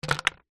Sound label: sport cricket bat set down concrete; zapsplat